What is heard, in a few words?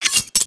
knife deploy